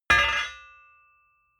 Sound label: hammer bounce ring